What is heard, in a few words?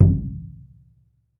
TOLOWA DR, American Natives; E-MU Formula 4000 Series Vol. 4; Earth Tones